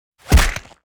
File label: kick body hit; fights bodyfalls; Asian Show Sound Effects